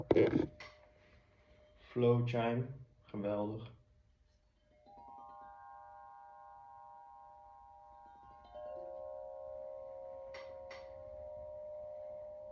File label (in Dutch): hangende chime, 9 tone sound healing chime